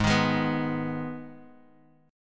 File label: Ab Minor Ab, Guitar; strummed; 4,6,6,4,x,x; 4E,4A,5D,5G,5C,6F